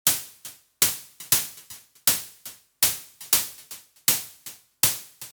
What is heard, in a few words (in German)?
Klingelton, modular synthesizer; Superbooth22